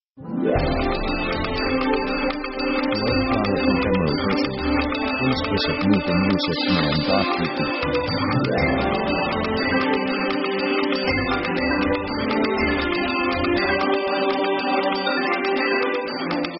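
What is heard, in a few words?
orchestra positive action; victory themes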